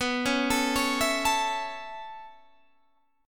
B 7th Suspended 2nd Suspended 4th down Guitar Standard, chord; B7sus2sus4; arpeggiated; JGuitar